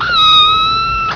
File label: kitten